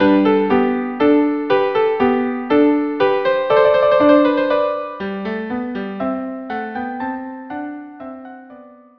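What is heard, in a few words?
minuet viola duo; alcina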